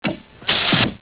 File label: arrow